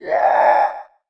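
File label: goblin voice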